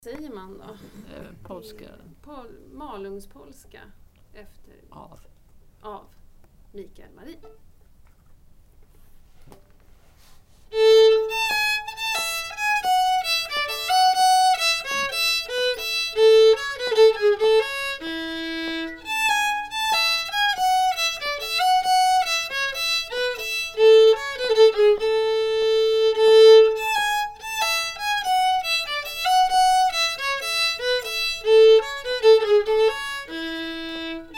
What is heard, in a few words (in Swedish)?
Polska